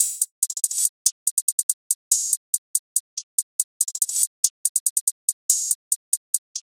Hihat Loop 142 BPM; Revenge; Cymatics; Fiji Hihat Loops & Percussion

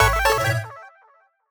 Menu FX Chiptune - A6(Sus2); Video Game; Cymatics; Video Game Sample Pack Vol 1

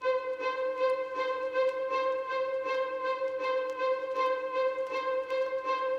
Classic Melody Loop 80 BPM G# Maj Strings; Melodics; Loops; Loop Stems; Cymatics; Eternity

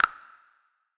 Low Snap; Cymatics; PHARAOH Beta Pack; Pharaoh Premium Drum Samples (BETA)

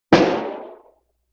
Drum Rim Shot Roomy; Vintage Cartoon; Drums & Percussion

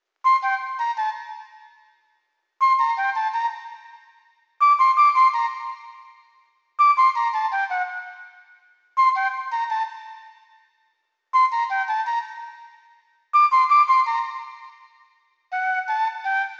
Melody Loop 110 BPM G Min Flute; Loop Stems; Cymatics; 2020 Melody Collection; 2020 Various

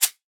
Percussion; Cymatics; Light Free Sample Pack; Drum One Shots

